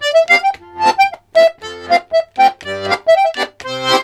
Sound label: 120 POLK, Accordions; USB Soundscan vol.40; AKAI